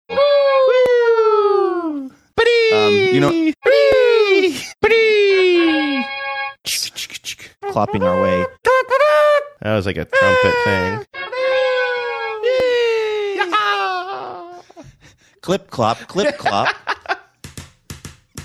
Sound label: fanfares clip clops